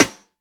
piercing acoustic snare sample D key, snare drum sample tuned to the D note; snare drum samples